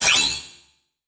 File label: Multimedia Internet CD-Rom Flash Electronic